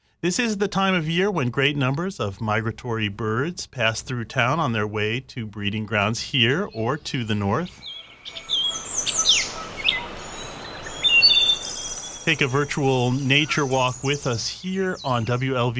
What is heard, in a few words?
Dawn Chorus